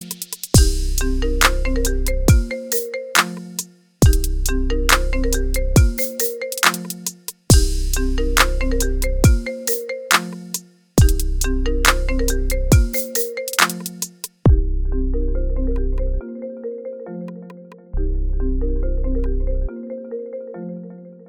iPhone Remix

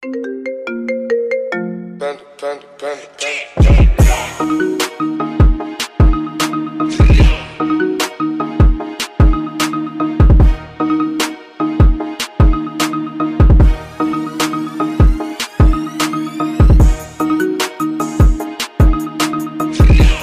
iphone 11 remix

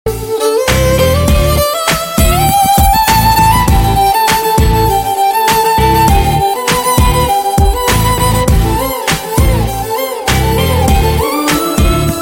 violin tuner

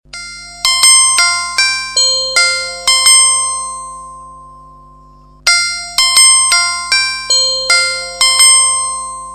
sms iphone x

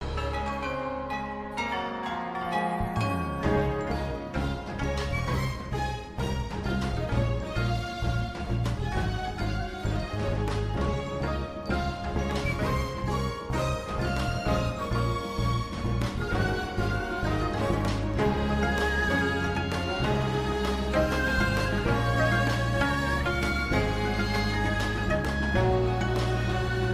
Samsung S24

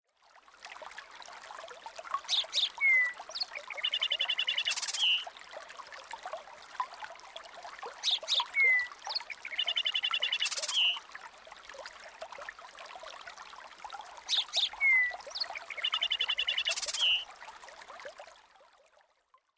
birds chirping

